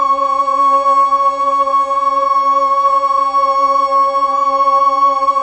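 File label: Fem C sharp 3, Mellotron Female Choir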